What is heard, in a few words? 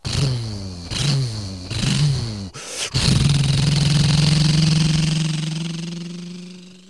Vintage Motorbike